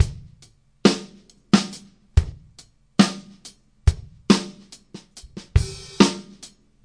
070 bpm laidback swingy kick snare hat

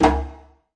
djembe big hit; ETHNIC and WORLD PERCUSSION

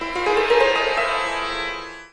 Lalit Swaras Up, Far East Drones and Plucks

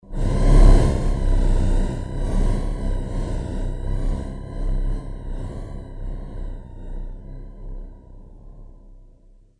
dry hive; Atmospherics

